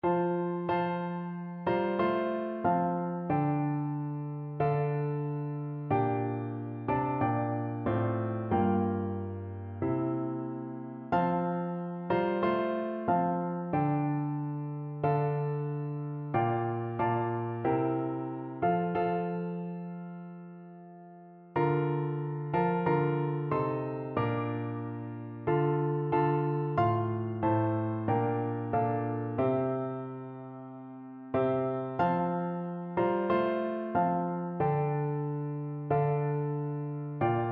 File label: piano, piano duet